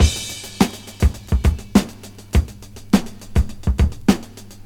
103 bpm fresh drum loop D key, Free drum groove - kick tuned to the D note. Loudest frequency: 922Hz